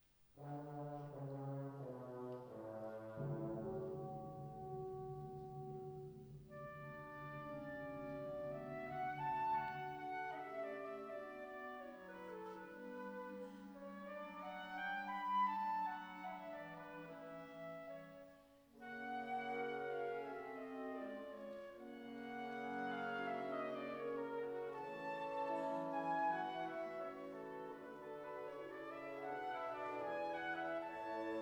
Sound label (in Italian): Concertino per Flauto e Banda; DonnaMusica; Op. 107